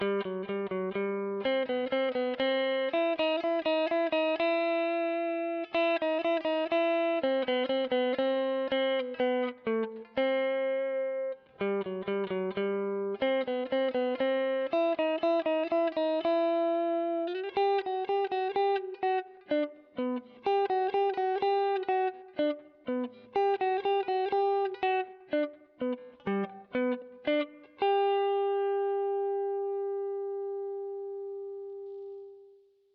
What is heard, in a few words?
Reverb